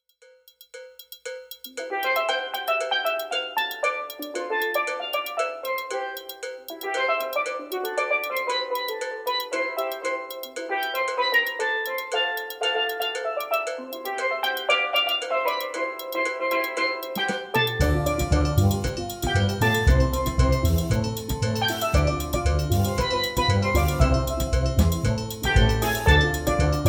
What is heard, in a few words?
Steel Drum Band